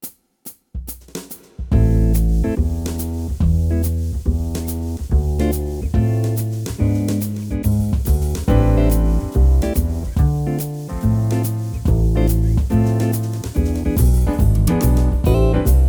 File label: style swing and jazz jazz gtr club, yamaha tyros3